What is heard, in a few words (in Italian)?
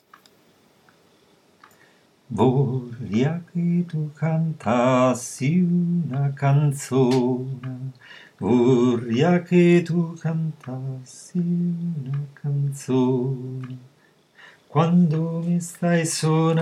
vorria alti